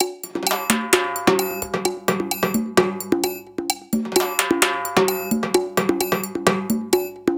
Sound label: Timba A; Latin (Латино)